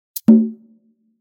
task completed, message ringtone; message tones

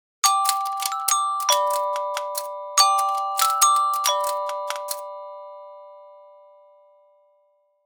letter to santa christmas ringtone

